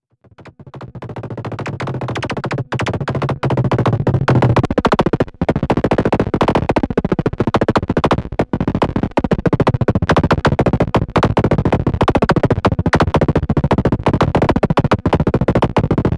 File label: zero noise mod, synth; analogsynth